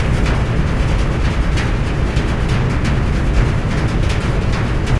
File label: boiler rough; video game; Source Engine; tf; lair